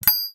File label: clicking; message tones